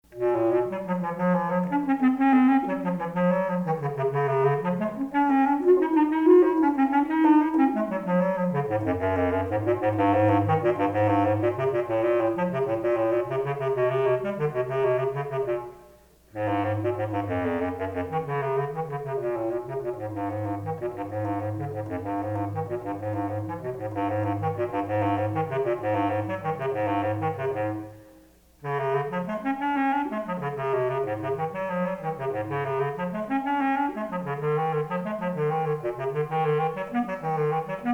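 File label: bass clarinet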